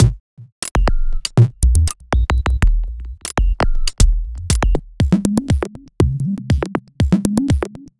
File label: Robot Thunder, Modular Electronica